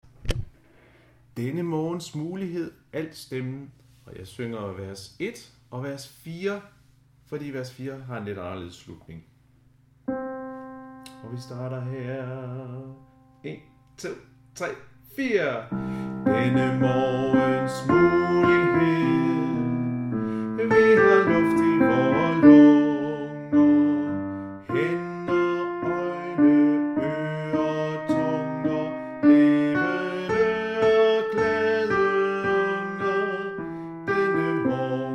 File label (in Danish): Denne morgens mulighed alt